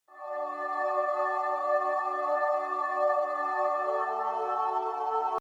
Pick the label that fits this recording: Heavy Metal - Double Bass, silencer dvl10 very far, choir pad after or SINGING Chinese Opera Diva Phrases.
choir pad after